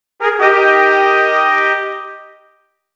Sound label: Jingle Event Good; Age of Empires The Age of Kings; NDS